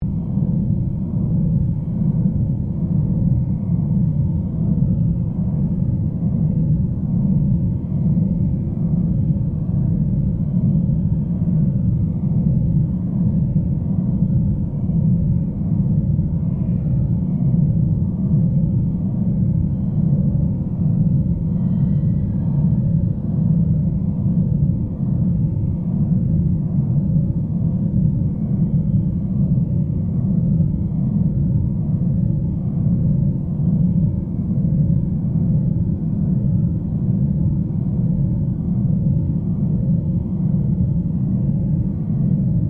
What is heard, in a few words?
Created Drone, Drone Sound Effects